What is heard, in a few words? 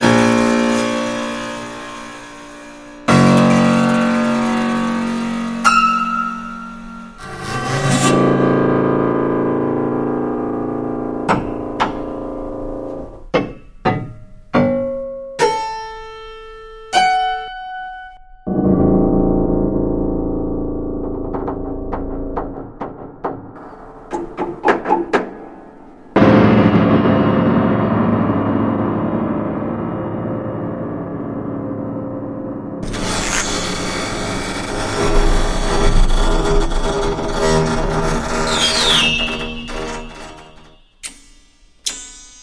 Prepared Piano